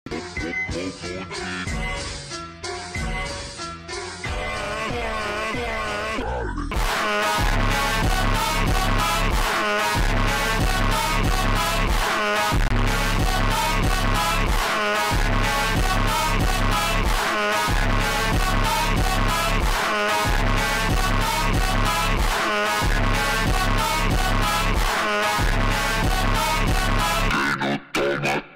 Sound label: beeper funk x montagem vozes profundas slowed